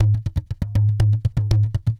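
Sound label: 120 DEEP DR, drum; Best Service Advanced Media Trax Modern Composer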